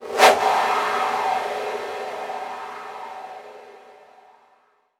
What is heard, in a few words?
VFX Short Fills & Reverse